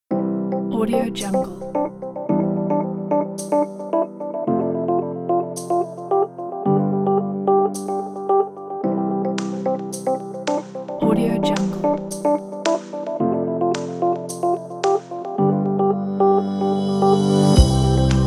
optimistic inspiration

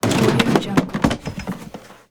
knocking stuff over

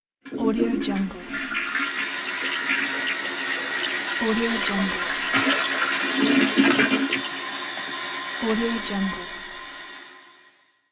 toilet flush

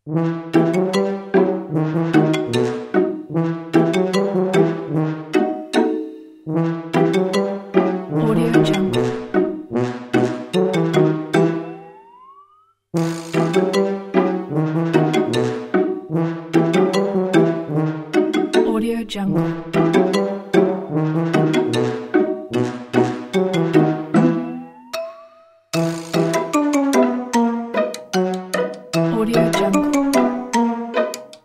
funny

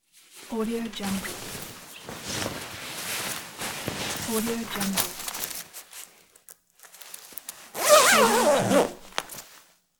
jacket zipper

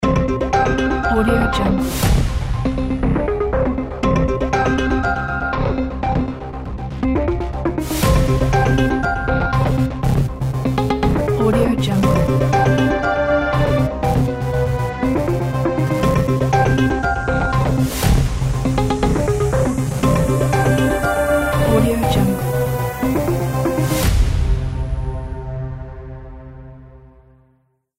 dark atmosphere cinematic ident

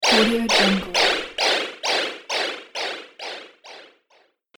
echo power decay

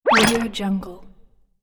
cartoon slip and hit

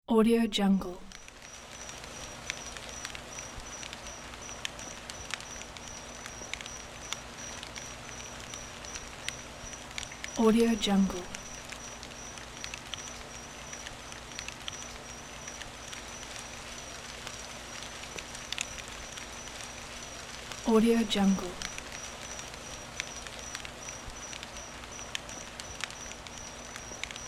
night rain in forest

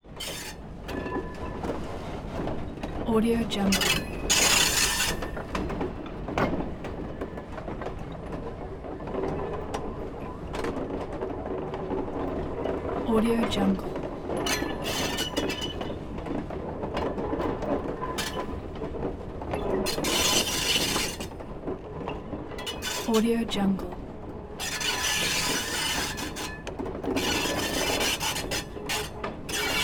train wheels brake squeal constant slow roll

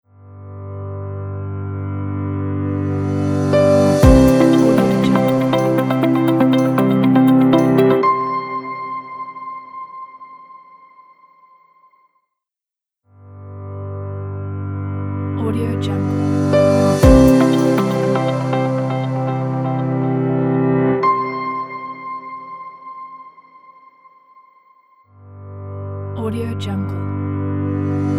corporate inspirational uplifting logo

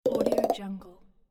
cartoon funny eccentric

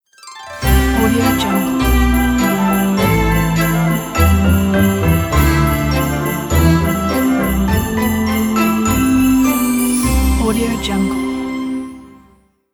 logo merry christmas

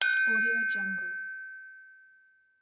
glass

